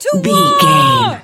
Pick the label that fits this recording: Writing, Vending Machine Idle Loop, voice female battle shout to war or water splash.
voice female battle shout to war